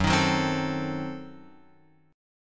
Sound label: Gb-Diminished 7th-Gb-down-Guitar-Open F; strummed; JGuitar